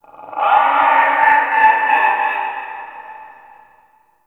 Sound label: Amb Corrupted Animal Distant; Ambience; Mendicant Area